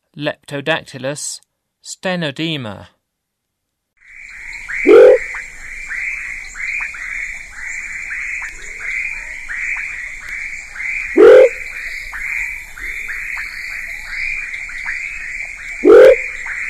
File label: Leptodactylus stenodema, Frogs of Ecuadorian Amazon; S. America